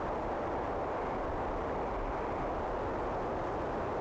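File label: cockpit airbrakes loop; PC Gamer IT CD 47; USAF; USAFDEMO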